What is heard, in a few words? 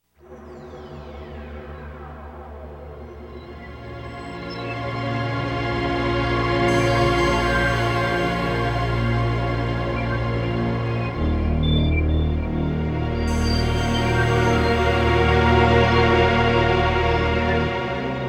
Distress Signal; Metroid Prime